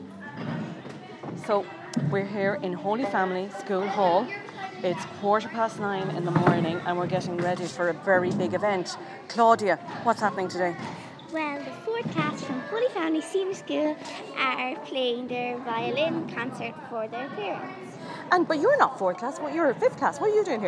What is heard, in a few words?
stringschool; at holy family